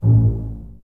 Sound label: BSS A 1 Pizz, String Ensembles Basses; Miroslav Vitous